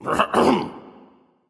clear throat; fenrir

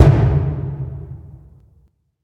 Taiko Mid; smite; raijin

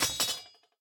minion battlefoley metaldrop; smite; npc; roman archer

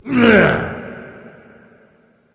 nv tongue; Smite; awilix

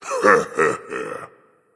hehe; fenrir

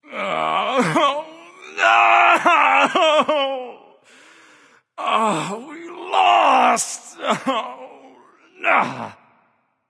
boohoo; smite; voicepack; ravana

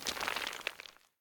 debris; Smite; Cabrakan

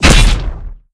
axe hit; sobek; smite

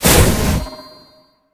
Activate; Smite; Susano

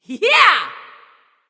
"yay"; smite; nuwa

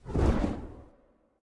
wingflap; smite; thanatos

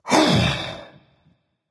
breath berserker; cuchulainn

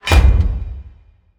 obelisk pound; smite; Anhur

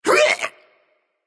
grunt attack, voicepack; smite; jorm

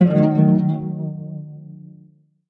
defeat lyre strum; smite; Apollo

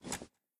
mvt armor; serqet; smite

